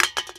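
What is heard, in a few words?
bouncy aluminium can bounce; bouncyobjects; Tony Hawk's Project 8; XBOX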